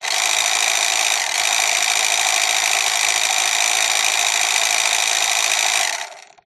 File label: motor small coarse interruption brief rattle toy car rc; Skyclad Sound